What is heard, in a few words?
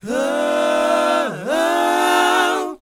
BOYZ A#, Vocal Planet R&B & Dance; ILIO